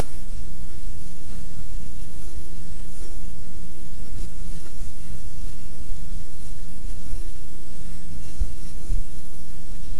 simple facebook inspired, navigation

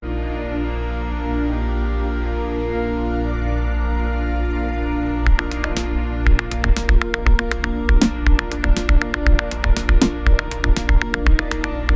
medieval beat for mmorpg

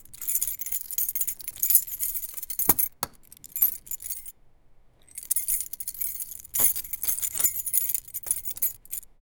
soccer keyboard

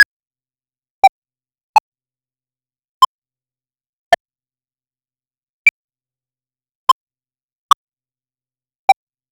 a super short cartoonish

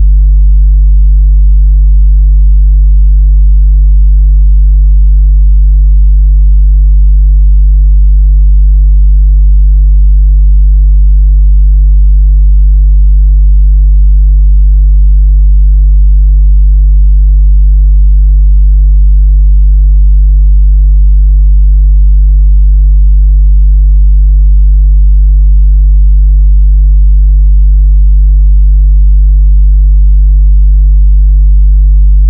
deep smooth 808, bass

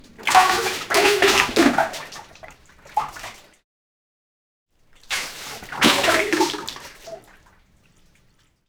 cat falls in bucket, flop

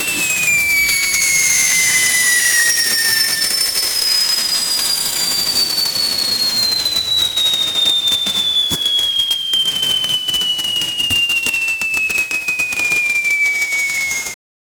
a series of balls being

